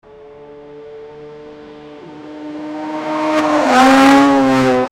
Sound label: the exterior sound of a, supercar